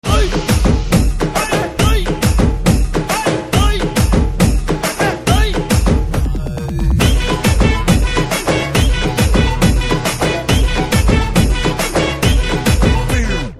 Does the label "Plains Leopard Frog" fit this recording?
No